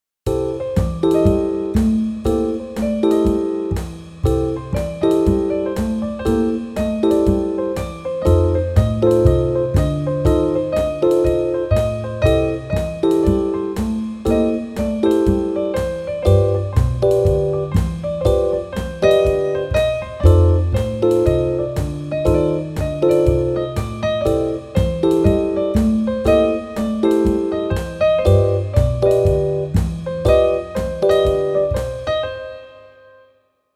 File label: MIDI blues